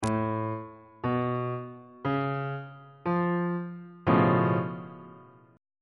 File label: d flat augmented 7th 2nd inversion on bass clef